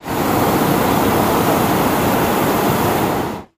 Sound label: Hot Air Balloon Burner, Medium Close, Medium On, Off, Long